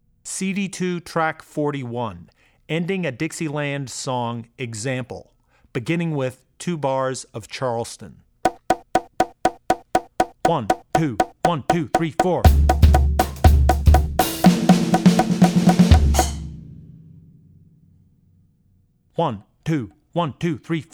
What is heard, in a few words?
Jazz - Dixieland (Song Ending); Drummer's Bible Tracks